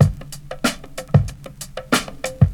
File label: funky